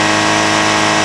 constant, Engines; E-MU Producer Series Vol. 3 – Hollywood Sound Effects; Ambient Sounds

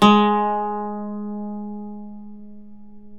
OUD G#2, Turkish Oud

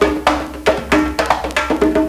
JUNGLE; AMG - Now CD-ROM (Roland)

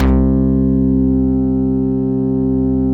SMACK; Masterbits - Tekkno Therapy

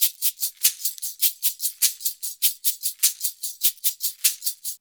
Modern Percussion Loops; Soundscan vol.56; AKAI

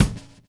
groove; AMG Gota Yashiki Groove Activator

